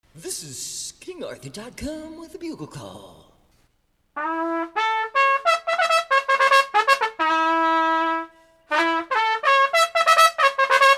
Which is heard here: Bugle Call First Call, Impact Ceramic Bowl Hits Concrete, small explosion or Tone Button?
Bugle Call First Call